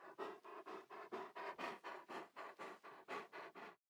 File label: breathe; Rust